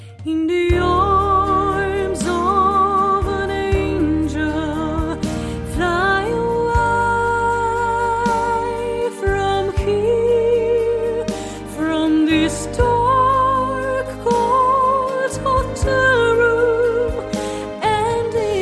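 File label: Is Calling R&B, ringtones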